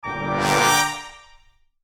Cartoon suspense brass rising tension; cartoon ascend

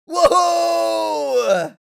Male win reaction

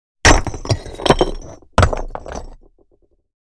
Medium bricks thrown on stone and asphalt

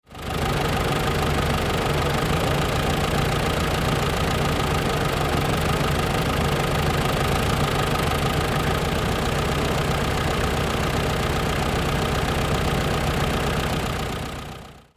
Excavator engine idle construction vehicle loop

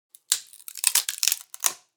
Cracking wood

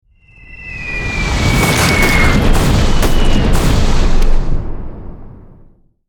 Aerial missile strikes bombardment explosions

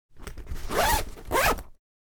Makeup bag zipper open or close

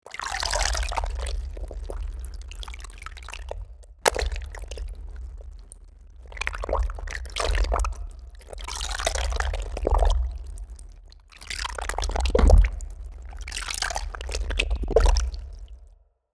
Cinematic experimental water texture

